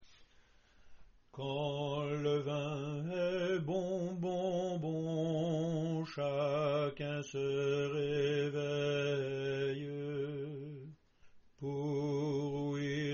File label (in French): alto; quand le vin est bon